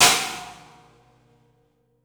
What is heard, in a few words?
CYMB; Houseworx; Drums; Cymbal